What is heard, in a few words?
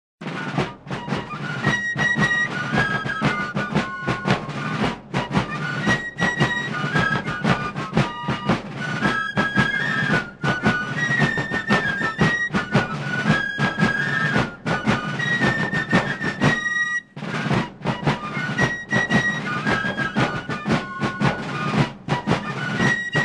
Drum & Bugle